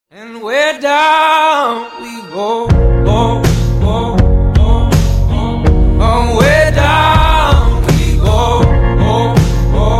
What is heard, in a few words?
English